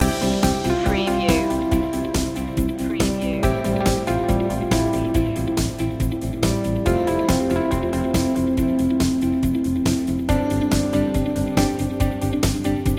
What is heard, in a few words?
new point of view loop